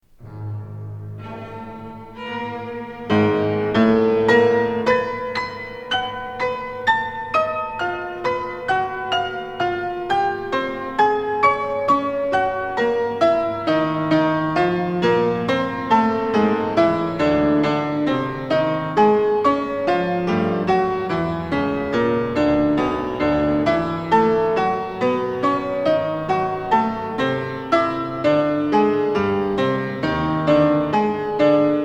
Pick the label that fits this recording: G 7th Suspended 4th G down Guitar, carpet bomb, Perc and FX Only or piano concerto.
piano concerto